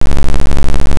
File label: motor